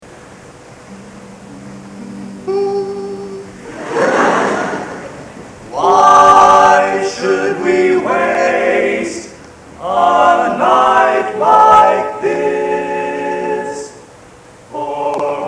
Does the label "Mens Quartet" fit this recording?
Yes